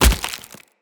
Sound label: Wood Flesh Impact; Weapon Impacts